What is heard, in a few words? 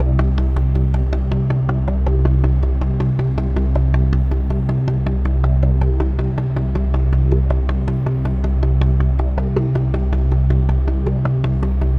AMBNT 160; Ambient